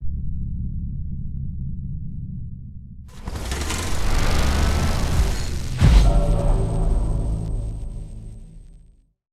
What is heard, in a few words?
Over Drive; Zerom